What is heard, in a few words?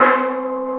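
steel drum wave; CMP - Analogon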